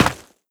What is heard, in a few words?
step run, footsteps, gravel; player